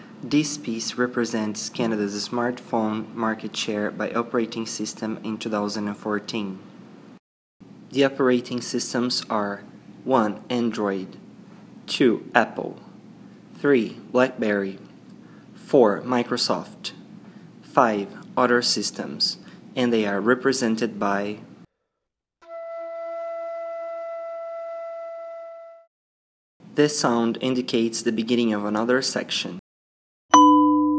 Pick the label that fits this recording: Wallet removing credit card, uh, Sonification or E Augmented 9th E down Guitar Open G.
Sonification